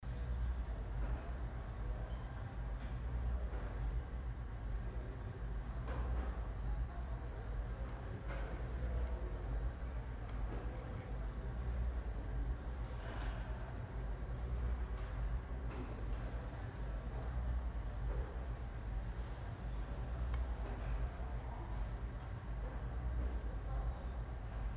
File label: Cafeteria Ambience